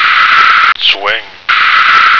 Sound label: swing